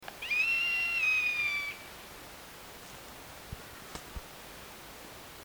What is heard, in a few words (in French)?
buse de madagascar